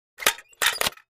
Mech Metal Object, machines winches

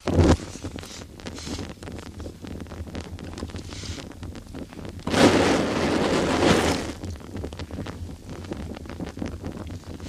Benzine Pouring On F; torches

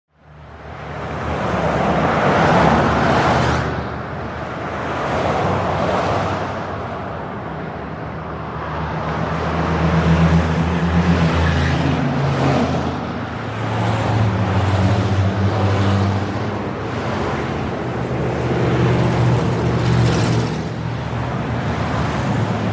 Traffic Highway Rush

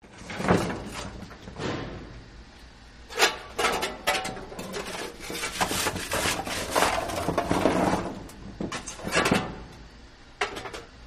Shoveling Coal Scoop, steam train

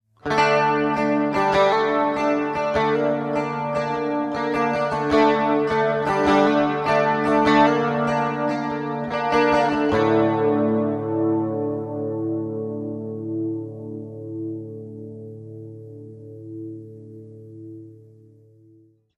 Electric Guitar Flanger

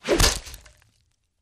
Bone Breaks Whooshes; punches kicks hits bones

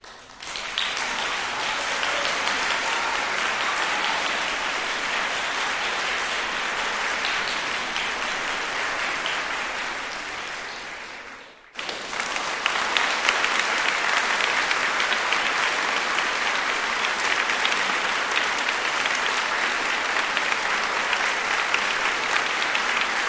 Audience Applause, crowds int

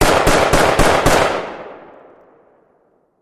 Machine Gun Multi Bu, bursts

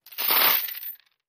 Chain Being Dropped

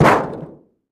Car Hood Drop On Groun

